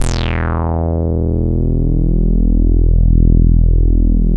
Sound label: OBERSYN E2